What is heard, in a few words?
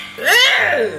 EHHHH